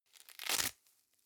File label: velcro open slow